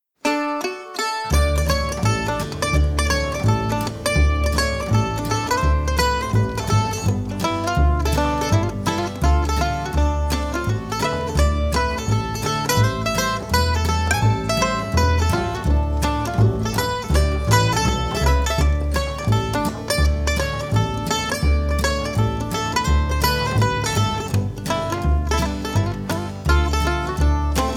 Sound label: Bluegrass Stomp; pacific northwest musicians